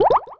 Move

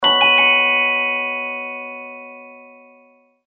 Downward Message, Celesta

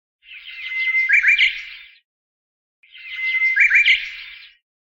Alarm; Hisense U820

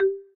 Effect Tick, ui; Pantech Vega-Secret-Note-2

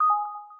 lock

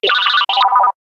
Alert Synthetic, ringtones; Motorola RAZR-V-XT885

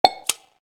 cam snap; LG; G2-Mini; camera

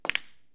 circle mosaic unlock; LG Leon 4G LTE

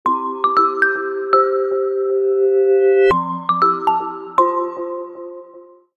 Spin

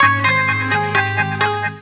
Synth; Cisco; Unified-Communications-Manager-12.5

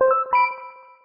Schedule, notifications; LG Swift L9

